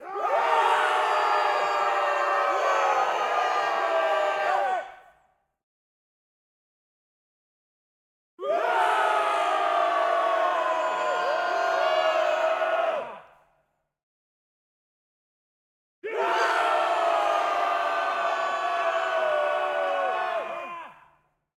War Unit - Small Crowd Mixed, Shout Charge Attack, Long, Aaah, Small Crowds Outdoors; ORTF; Game Audio Factory